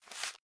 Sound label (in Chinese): 书店内 移动书本; 序章